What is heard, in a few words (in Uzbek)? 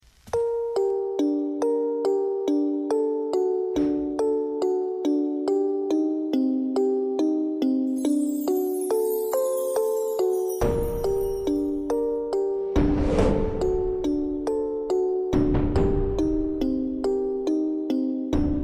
telefon chaqiruvi uchun ajoyib qung iroq musiqasi